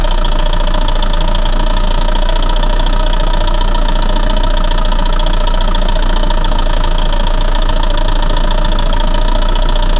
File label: flying car engine sound; SFX Engine